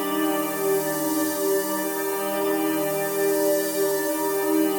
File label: Pad Loop C, shimmer and sparkle; Pad Loops